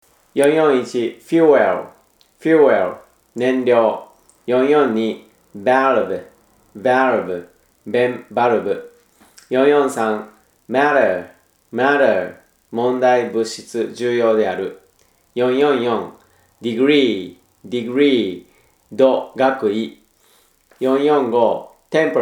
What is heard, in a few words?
tango